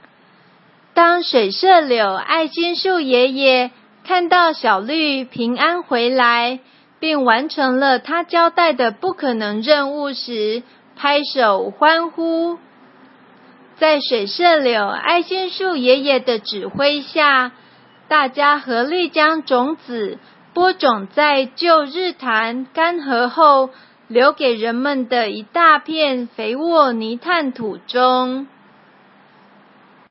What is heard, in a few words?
music